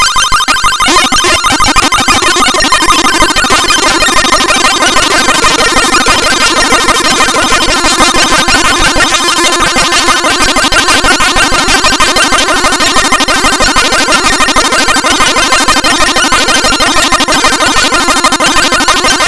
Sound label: acid chip